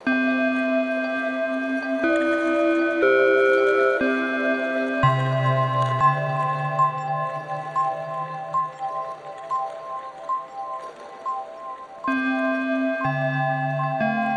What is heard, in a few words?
Bathroom FX Stems Loop